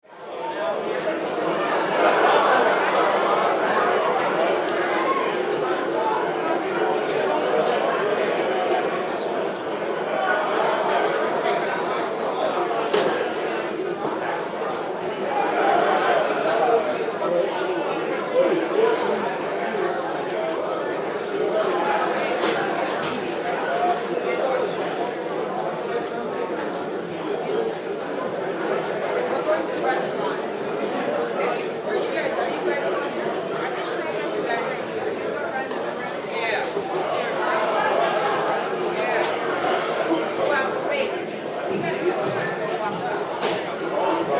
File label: Ambience Crowd Walla Hall; Crowds; SFX Bible